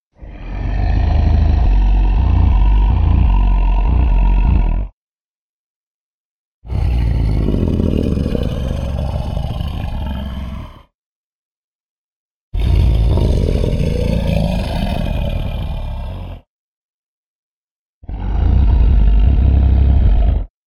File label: diplodocus roar; Diplodocus footsteps; dippy-on-tour